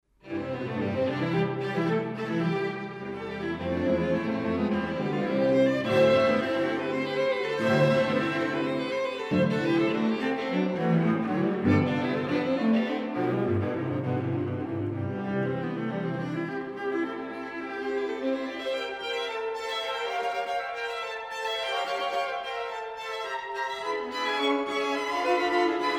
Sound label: sextet; gliere